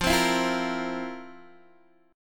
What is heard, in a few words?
F Major 7th Suspended 4th, Guitar, DADGAD